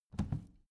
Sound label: plastic trash can open regular